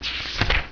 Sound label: PAGETRN